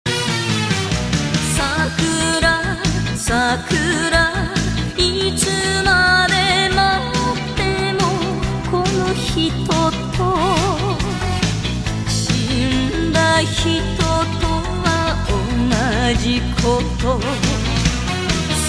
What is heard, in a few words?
enka